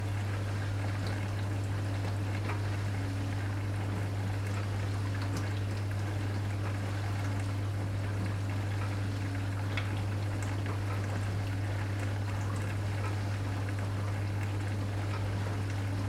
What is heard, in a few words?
Electra C1845W dishwasher ECO